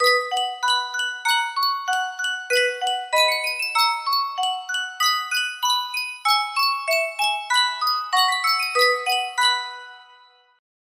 sankyo miniature music box

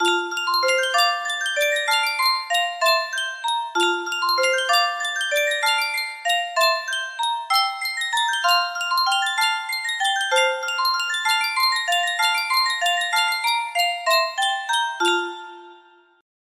sankyo music box

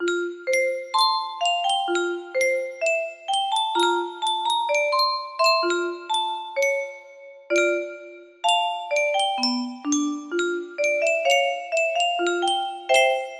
Yunsheng custom tune music box

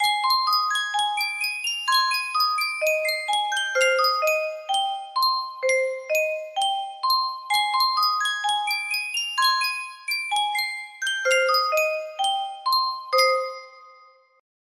Yunsheng music box, music box melody

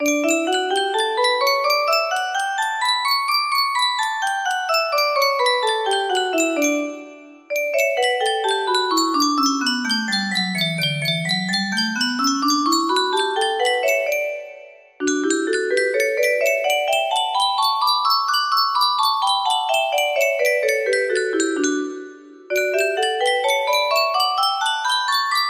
d major, music box

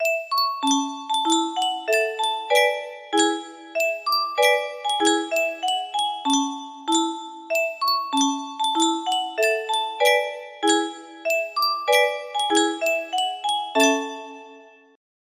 yunsheng music box, melody

